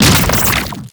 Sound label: Horrors Arm Spawn; Warframe; ManInTheWall; Octopede